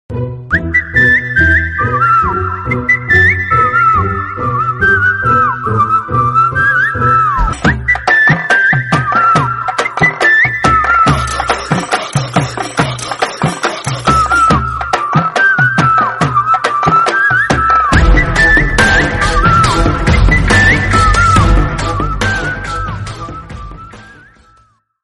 kathakali theme

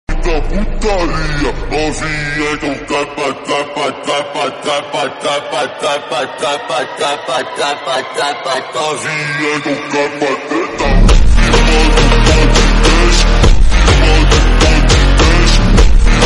slowed reverb remix

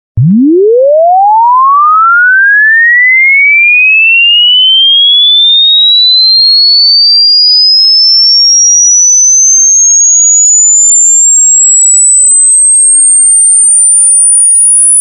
beep beep beep rmx

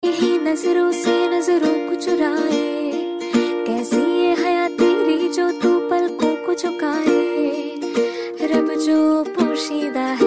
song female version

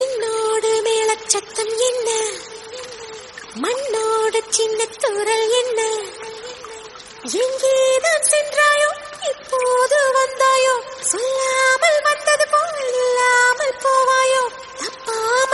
song tamil movie; mazhai

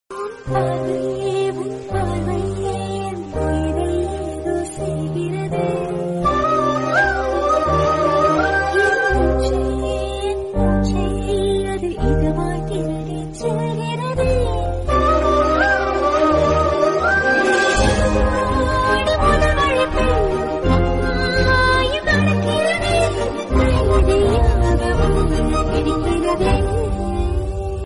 tamil song

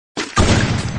awm sound; free fire

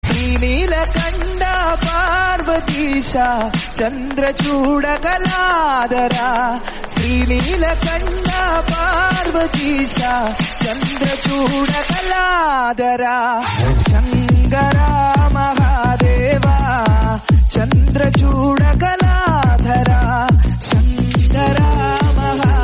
hindi song

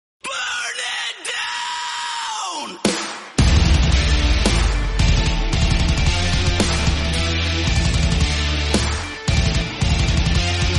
entrance tuning, bgm; Seth Rollins

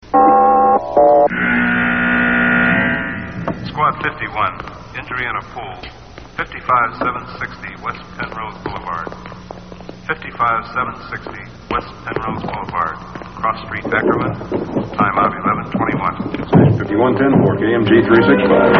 tones; squad 51

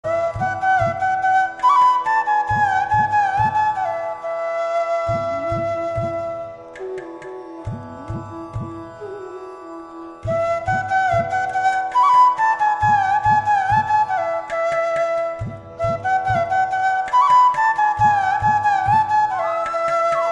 flute instrumental

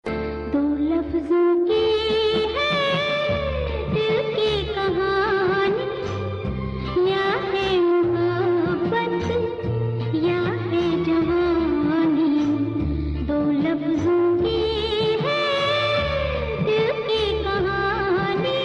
hindi song; The Great Gambler

